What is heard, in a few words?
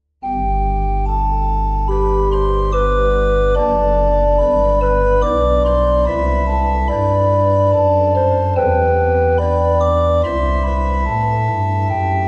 reverb, organ